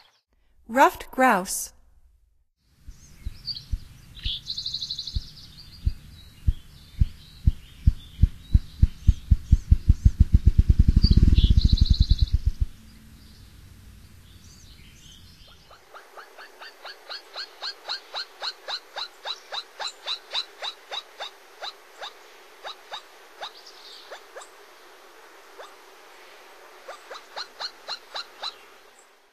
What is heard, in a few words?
Ruffed Grouse; North America; Stokes